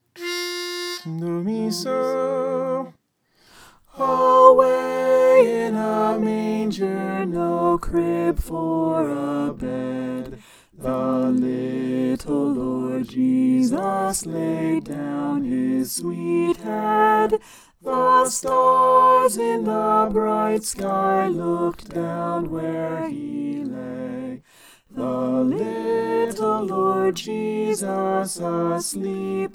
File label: soprano